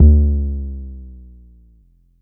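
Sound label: Long bass drum, Jomox Airbase99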